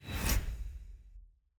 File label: celebration outro